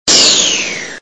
Dash; Spindash Release

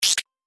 Electronic Squelch

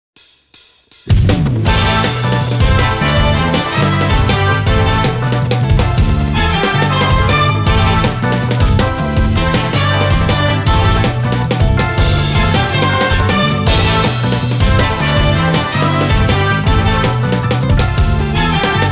Stage Forest; Guardian Heroines Final

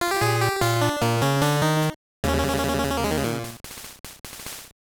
Lose Life; Donkey Kong Land 3; GB

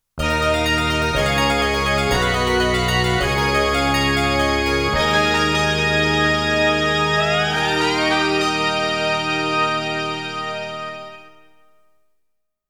Game Over BGM; MIDI Power Ver.3.0; X68000

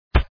step grass; Super Mario 63

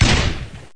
fire 50BMG

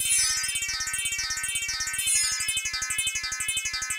Cartoon; Long Hallway; LSD Dream Emulator; ps1 gamerip 1998

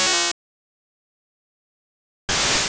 Metal Claw

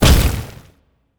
Stone Kick; helltaker

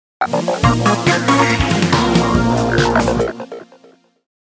Lose Jingle; deca sports sports island wii 2008

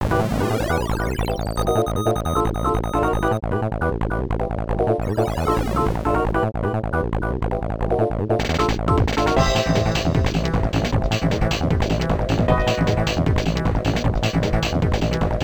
Techno; Sonic Advance 2; Techno Base Zone - Act 1

